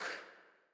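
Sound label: choir "K", women; Sound & Vision - Gigapack I CD 2 (Roland)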